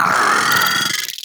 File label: Amalgam Moa Vocal Attack